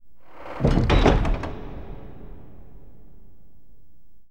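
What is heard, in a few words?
SFX DOOR CLOSE; gothos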